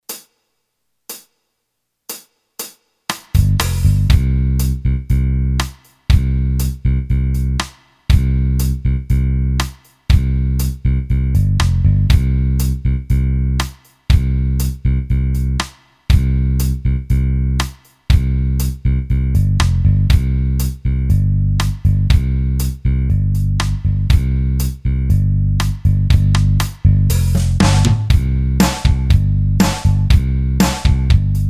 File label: COUNTRY, Boss DR-3